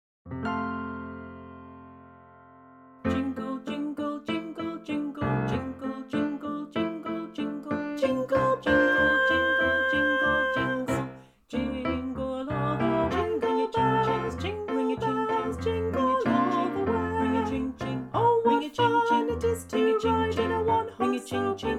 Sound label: jingle bells full mix; christmas past and present choir celebration 2024